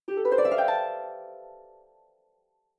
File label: Harp magical